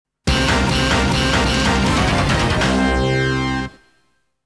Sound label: Stage Clear